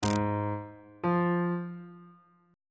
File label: relative minor of a flat major is f on bass clef